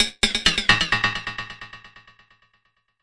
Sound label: 130 high tension echo, drum loops and breaks